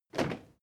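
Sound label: step crowd march normal single left, footsteps; schritte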